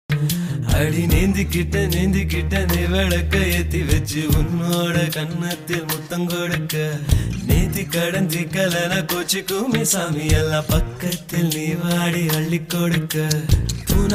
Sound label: tamil song